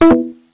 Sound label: Android Q Call Sound